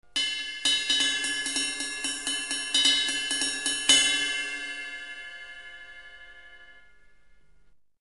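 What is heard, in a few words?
triangle; Треугольник; Тарелки; Тромбон, Труба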